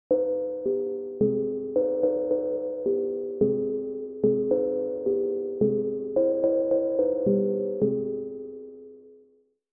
Errie Chime, Roland JU-06; sfx; SoundDiviner Project; Roland JU-06 Pack09; DigiD sfx pack

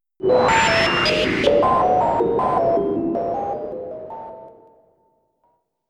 Radio Airwaves

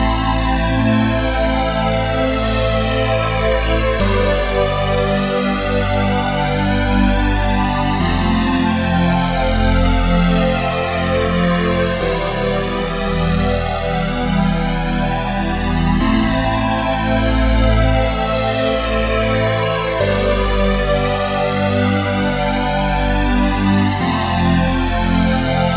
Pushing Pads

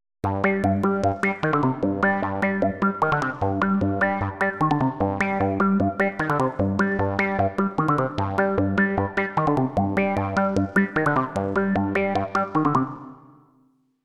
Blip

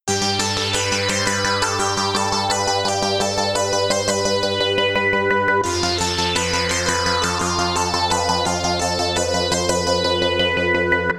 Pulsator

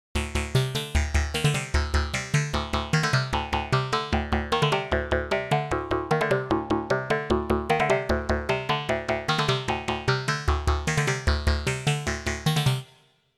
Blip

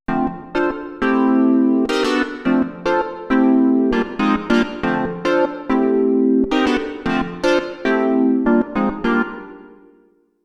Minor 7th Chord

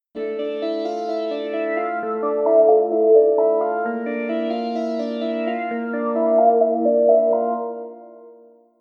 Nasal Organ

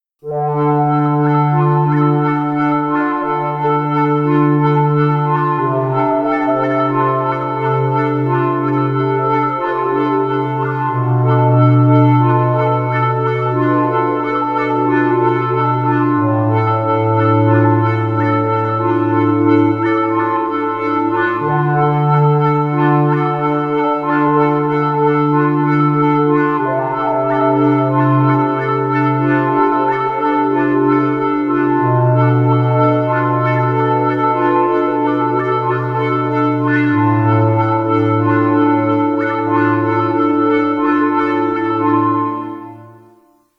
Hollow Res

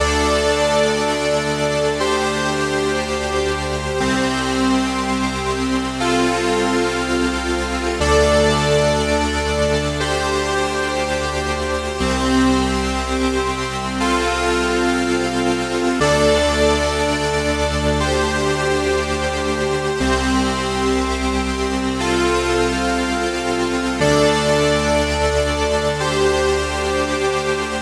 Super Saw

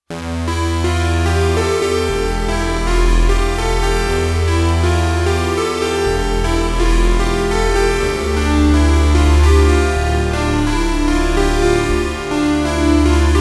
Polysix

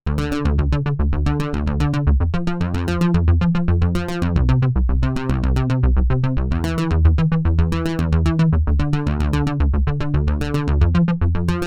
Velocity Arp

